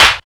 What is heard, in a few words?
PRC KNOK